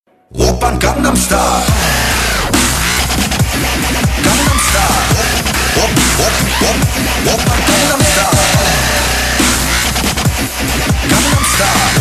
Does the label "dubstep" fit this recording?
Yes